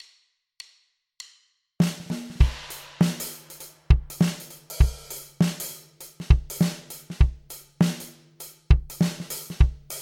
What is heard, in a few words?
pocket simple shuffled